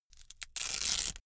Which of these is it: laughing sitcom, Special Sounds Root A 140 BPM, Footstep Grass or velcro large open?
velcro large open